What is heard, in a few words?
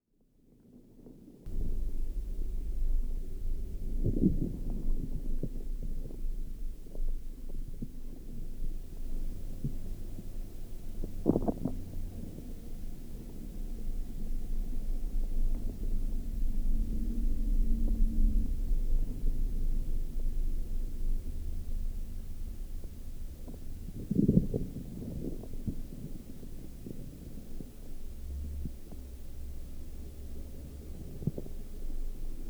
geophone